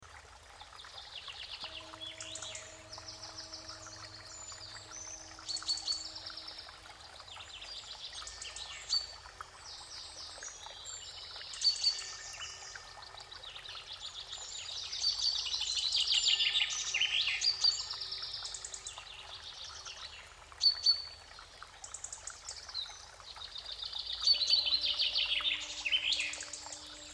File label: Garden Of The Singing Stream, naturesounds